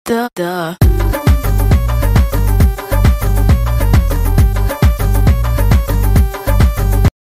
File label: random music